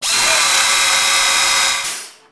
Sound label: ship trunk close; Earth and Beyond; EA Games